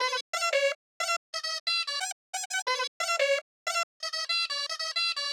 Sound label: Pepa Chop BPM 90; Gully Loops